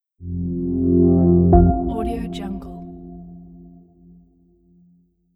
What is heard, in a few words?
simple logo